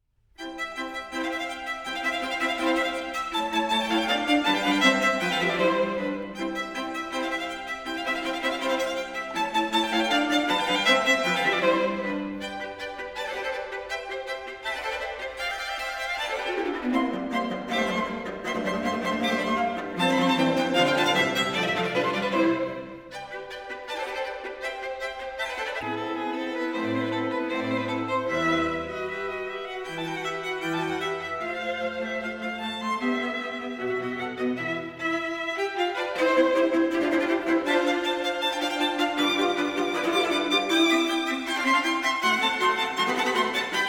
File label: String Quartet in C Major